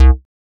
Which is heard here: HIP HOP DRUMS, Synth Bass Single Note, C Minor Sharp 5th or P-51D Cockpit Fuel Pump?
Synth Bass Single Note